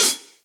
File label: Hihat Pedal Reso Classic, Drum Hits; Standard Hits; Ableton; Factory Packs; Session Drums Club